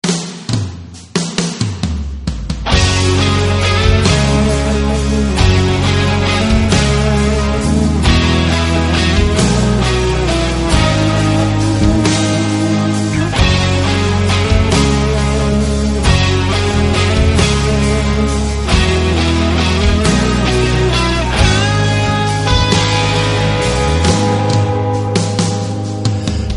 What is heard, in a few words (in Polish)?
gitara medytacyjna